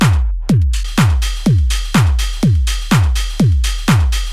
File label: beatprogramming, Techhouse-Beats; mit Ableton Live